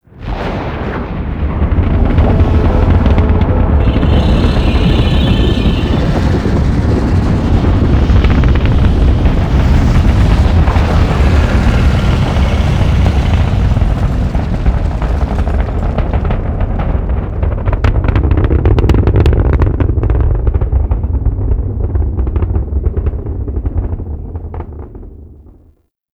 take off charge; Murex; Warframe; The New War

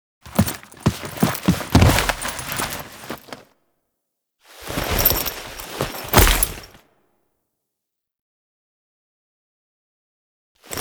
Death Foley; Warframe; Duviri; Cinematics; Teshin

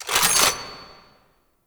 Assist Rechamber; gameplay; Warframe; Nineteen Ninety Nine; Quincy

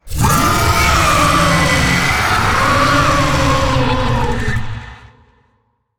Vocal Death; Warframe; Zariman; Void Angel

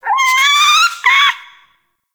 Rabbit Ambient Call; Warframe; Duviri

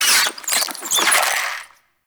Infested Ashen Vocal Attack; Warframe

